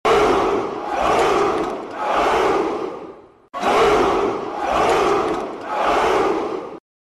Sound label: spartan chant aoo aoo aoo